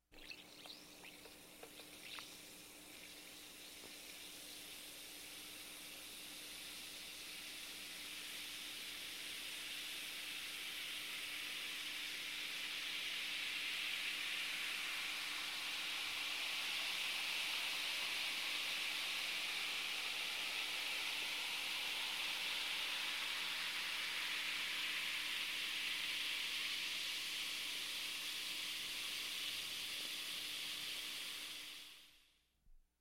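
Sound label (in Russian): газированная вода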